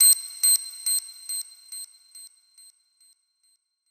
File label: Bomb Tick, Beeps; SFX